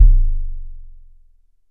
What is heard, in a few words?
Drum X Sub, Pearl Drum-X